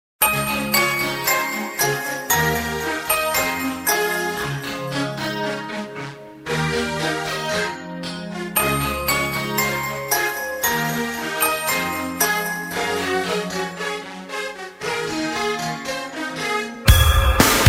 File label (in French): accords